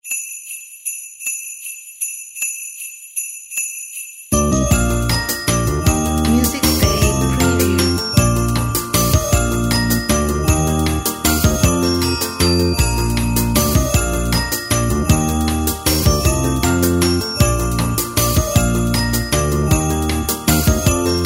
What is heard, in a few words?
Christmas Fairy